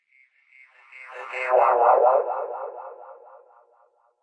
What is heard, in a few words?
twangy fx; Ze Franks Pain Pack; sweeps and fx